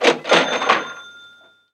purchase mono; dokobots

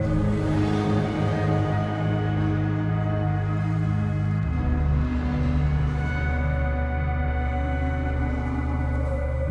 sounds music; Halo Combat Evolved; Xbox

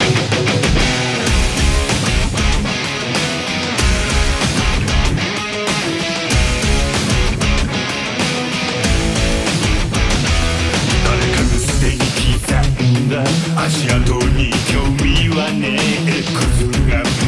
Character Creation BGM

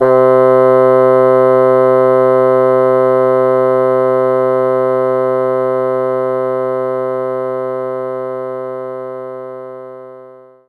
Bassoon C3; knights try

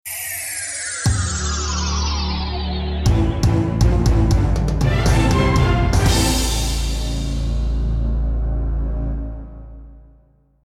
Whoops (Game Over); Merry Gear Solid 2: Ghosts of Christmas Past

